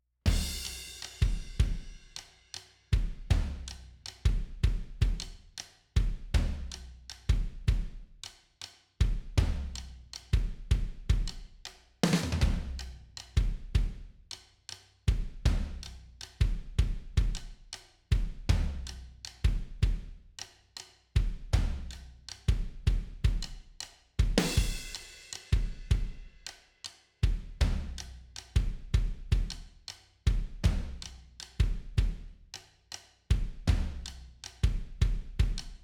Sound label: drums